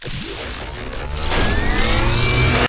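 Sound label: spectre overload mid distance; Titanfall 2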